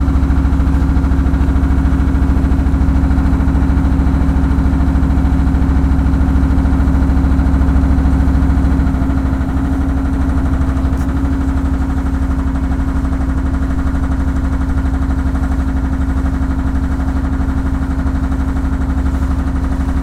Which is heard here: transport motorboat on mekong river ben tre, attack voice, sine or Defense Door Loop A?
transport motorboat on mekong river ben tre